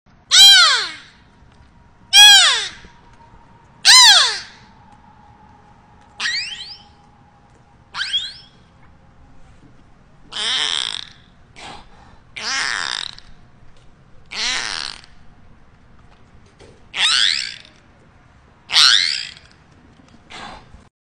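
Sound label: beluga; beluha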